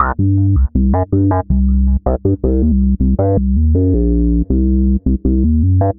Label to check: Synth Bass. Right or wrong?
Right